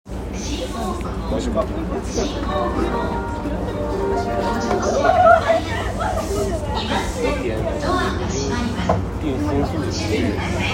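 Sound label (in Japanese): 山手線 新大久保 counterclockwise, train sounds; JR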